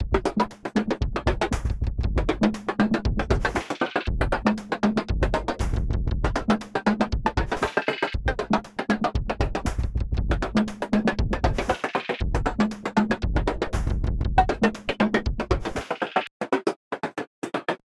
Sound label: 16th Rhythm